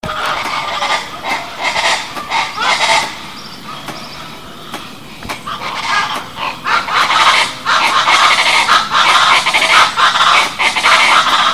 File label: Ortalis colombiana